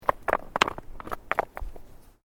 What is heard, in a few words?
Bricks Movement Minor; Material components for constructing more complex sounds